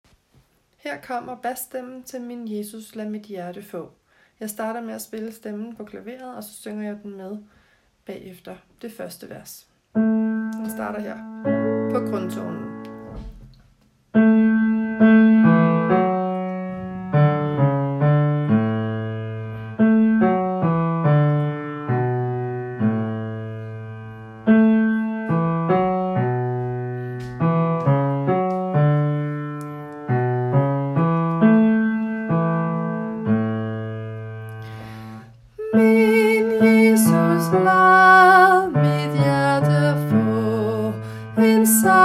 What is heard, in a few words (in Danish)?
bas